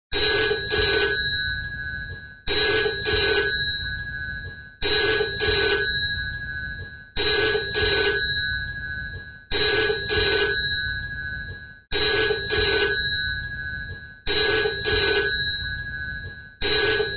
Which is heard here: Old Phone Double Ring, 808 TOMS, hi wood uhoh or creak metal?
Old Phone Double Ring